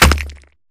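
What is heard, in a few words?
Bullet Light Ballistic Concrete impact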